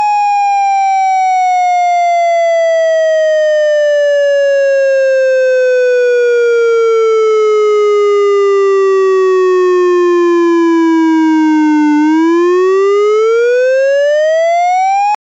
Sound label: siren